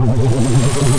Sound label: phasemissile; Tanks